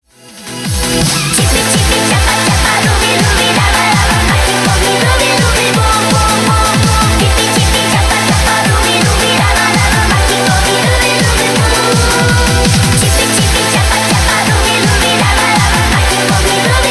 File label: eurobeat remix